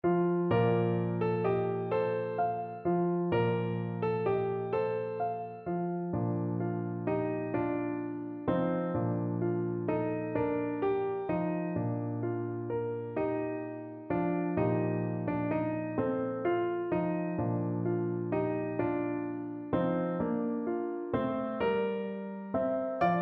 clarinet

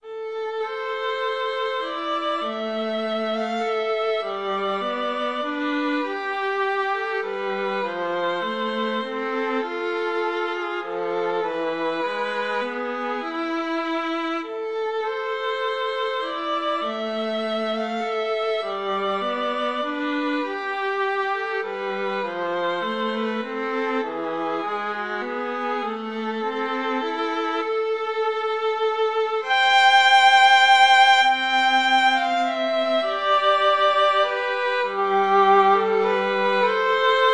greensleeves, violin viola duet

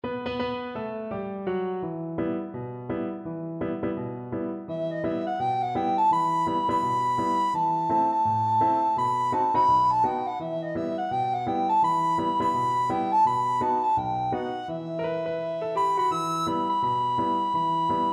recorder

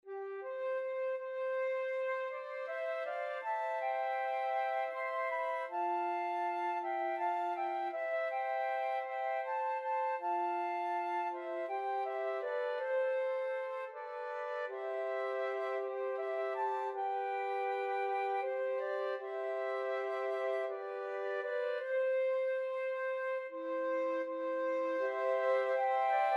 flute quartet

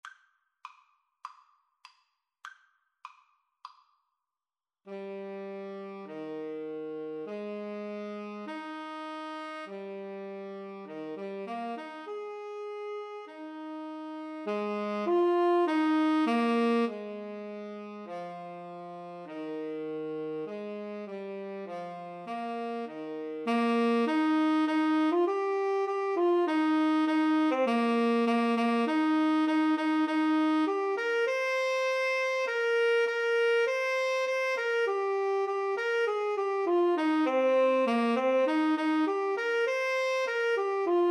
Clarinet Tenor Saxophone

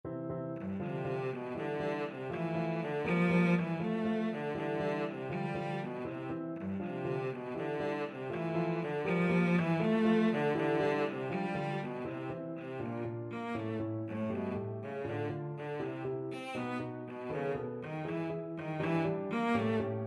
doll, cello; tchaik

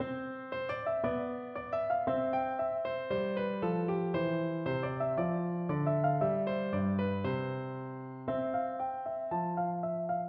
sonatina in C piano; duncombe

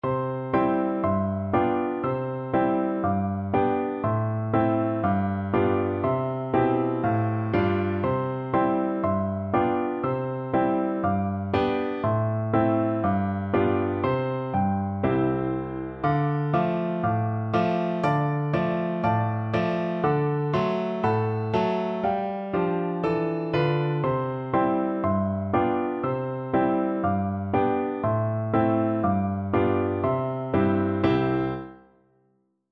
piano, piano duet

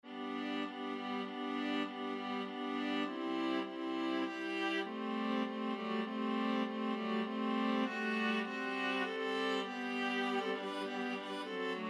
viola quartet; bach sheep

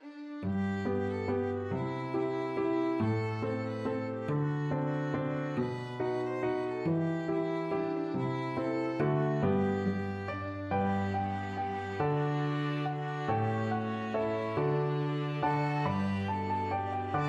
we wish you a merry christmas piano quartet

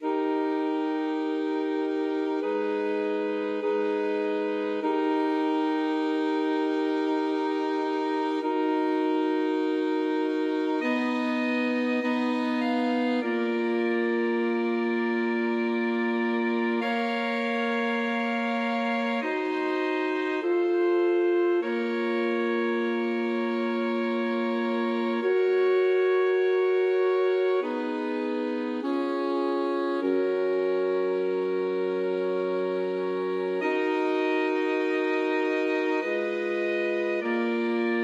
alto sax quartet

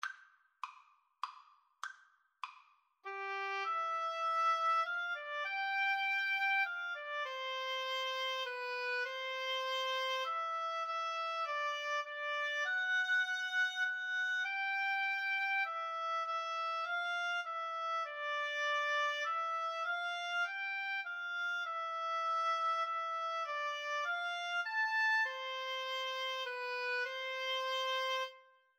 crimond, Oboe Cello Duet

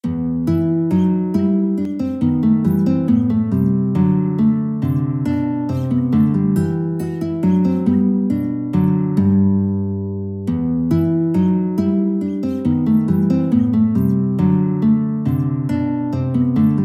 minuet guitar; handel

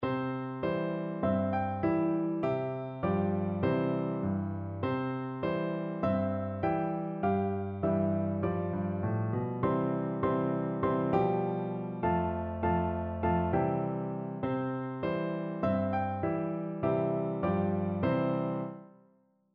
2 piano

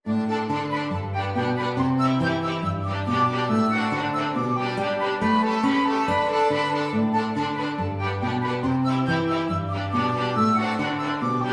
flexible mixed ensemble, 4 players

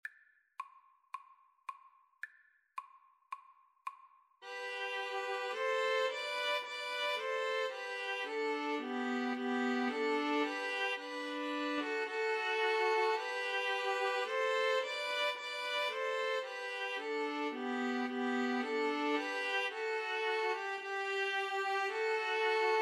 ode to joy, String trio

